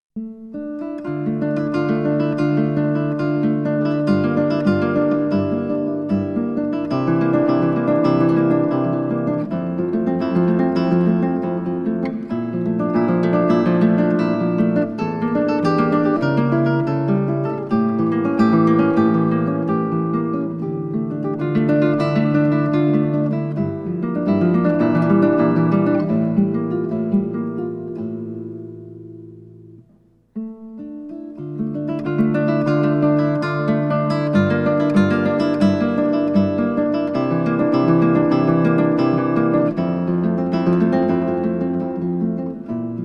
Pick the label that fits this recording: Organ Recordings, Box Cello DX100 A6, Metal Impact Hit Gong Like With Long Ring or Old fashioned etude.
Old fashioned etude